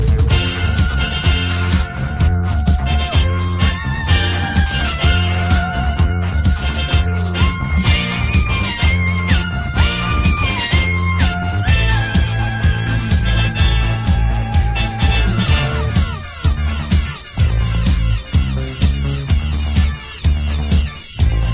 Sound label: theme funky version; doctor who